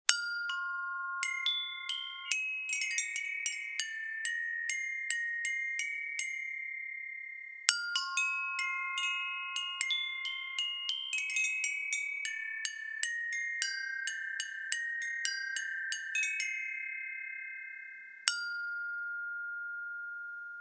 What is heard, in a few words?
beating chime